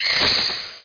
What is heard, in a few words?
Monster; Conquer Online 2.0